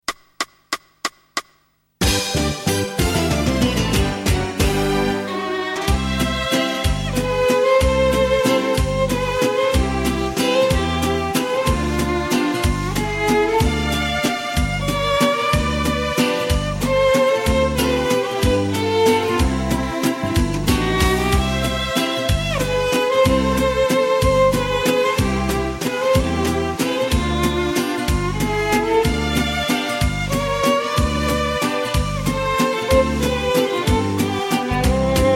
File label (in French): Valse Viennoise Karaoke Playback Instrumental